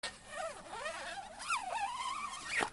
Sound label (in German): reissverschluss